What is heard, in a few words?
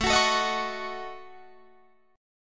A 6th Flat 5th, Guitar, Drop D